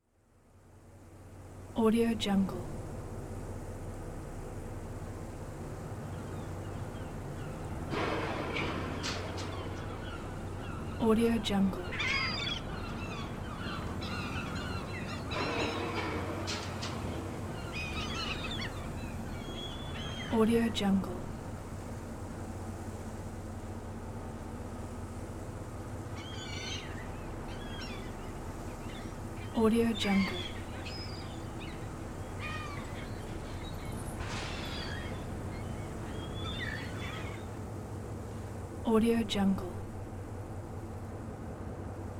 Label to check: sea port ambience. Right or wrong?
Right